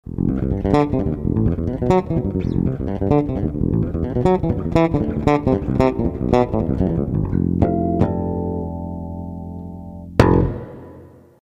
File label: Room Verb